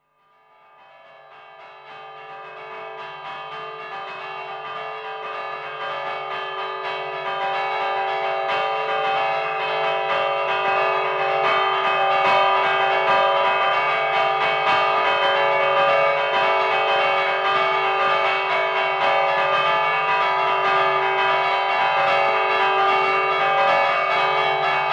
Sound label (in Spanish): repique, campanas